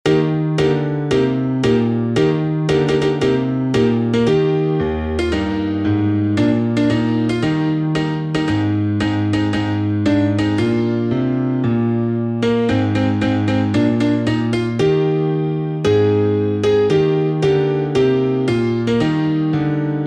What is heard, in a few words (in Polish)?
hymn podklad